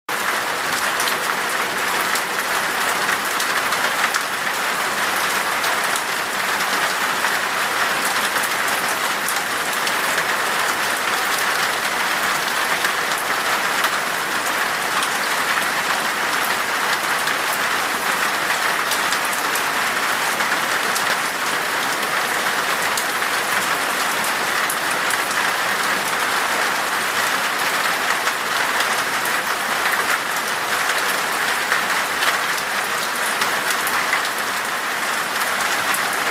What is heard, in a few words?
rain